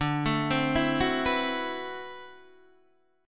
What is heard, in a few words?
D 13th D, down, Guitar, DADGAD